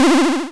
energy, square waveform; Square Waveform sfx

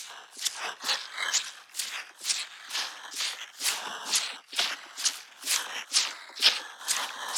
130bpm Small Medicine Bottle Shaker with ring

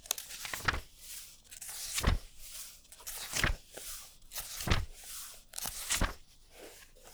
Page Turn Fast; Books, Paper, Writing

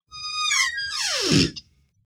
Creaking Door; horror sfx vol 1

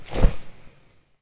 pick up

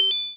coin, square waveform; Square Waveform sfx

